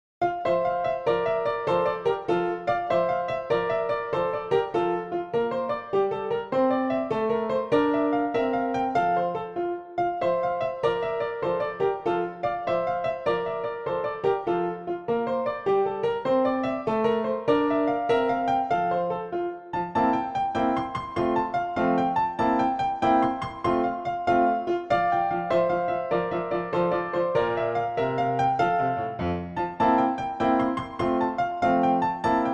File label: Dance PianoTeq Bechstein; Dittersdorf 12 English Dances